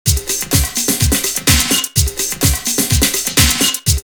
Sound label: 126, drumloops, Techno&House; X-Static Goldmine; 90 s Sample CDs